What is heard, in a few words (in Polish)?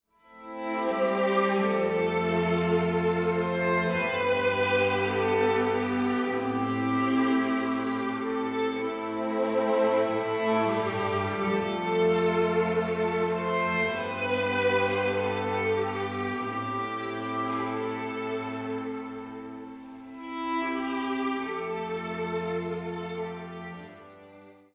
kwartet; Braveheart